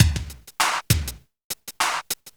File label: Club Tip, R&B Drums